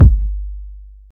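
warm kickdrum g key, kick tuned to the G note. Loudest frequency: 103Hz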